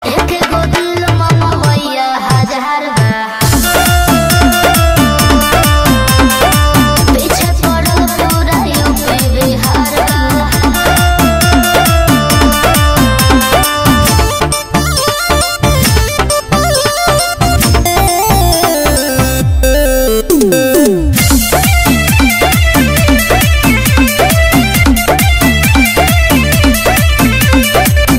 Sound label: Ringtone